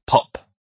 pop

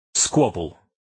squabble

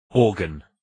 organ

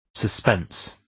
suspense

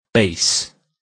bass

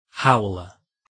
howler

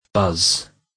buzz

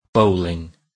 bowling

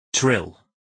trill

